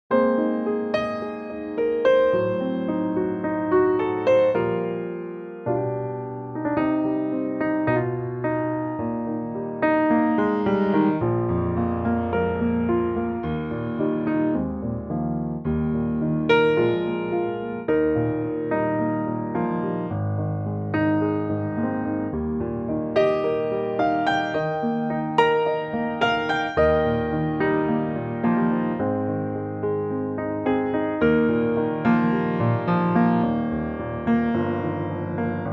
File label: Eb piano